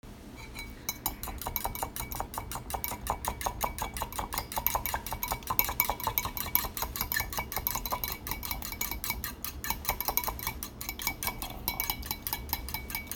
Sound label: Beating Eggs